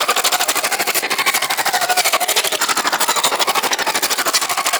Glaive Hover Loop; Tenno; Teshin

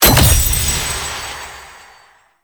Cet Invasion Offering Pickup; Warframe; Cetus

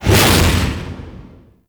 Heavy Hammer Whoosh; Warframe; Duviri; Dax; Weapons

